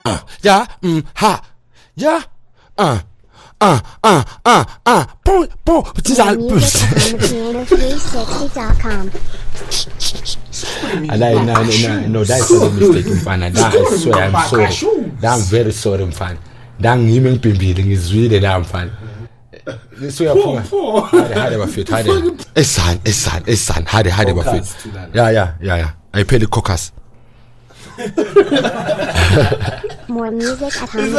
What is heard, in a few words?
kwaito